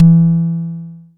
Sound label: analog tonal, kick; Ableton Foundation FreePacks